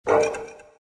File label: dragon pain; Dragon Knight